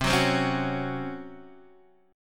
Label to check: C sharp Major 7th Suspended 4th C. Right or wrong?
Right